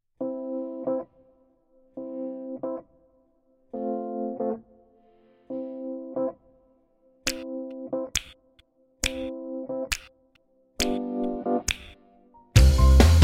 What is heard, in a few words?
Instrumental